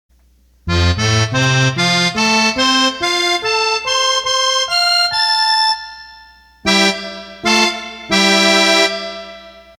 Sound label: Accordion